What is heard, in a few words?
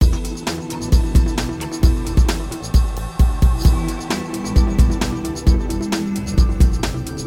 Fill